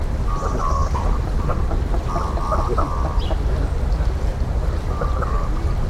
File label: Common Merganser, Gaensesaeger